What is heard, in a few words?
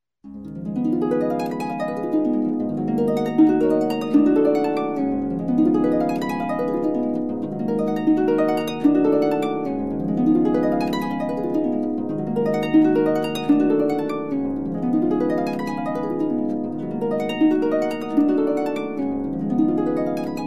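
harp arpeggio continuous fragment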